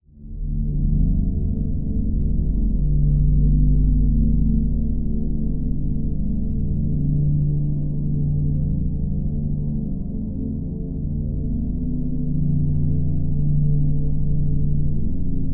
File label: Drone Musical Flutter Slight Flange Low Tones; Production Element Imaging; AdobeCC